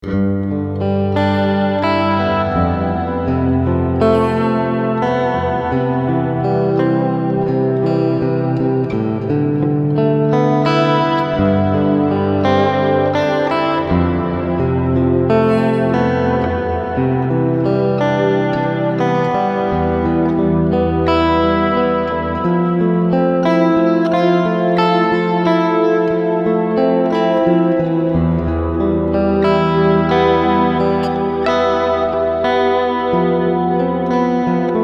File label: LINE Mod